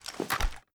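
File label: weapon foley assault rifle xmag reload end; gmod; viper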